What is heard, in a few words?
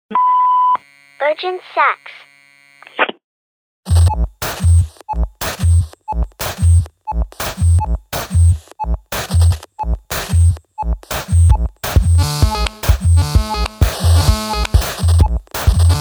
Urgent Sax